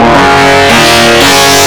synth seq; Big Beat Samples & More; AKAI; ESO SEQS KIT

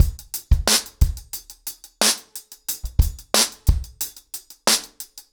ACOUSTIC DRUMS, 90 BPM

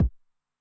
BD Med Tune Short Decay, 909mod, BASS DRUM; classic machines

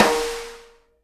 snare RIM RING, Live Snares

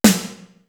BIGGER BX, TOM; East West - Global Sounds; D4 BD SD TOM

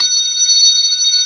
B3 Hi Slow F#5; Proteus 2000; Digital Sound Factory Proteus 2000

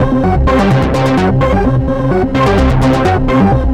Arpeg, 128bpm, C, analogue circuit; musicradar

